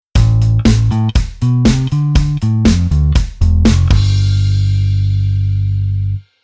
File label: Bass Arr Move Oct CT CS